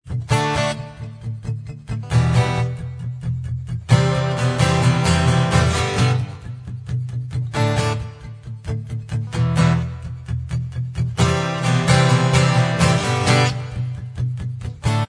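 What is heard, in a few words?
acoustic instrumental track, backing tracks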